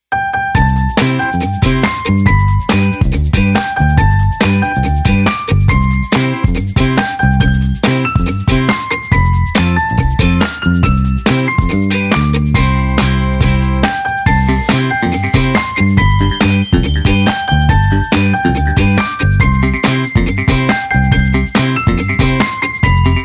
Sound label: pianio guitar